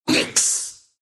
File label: pain, ru; dota2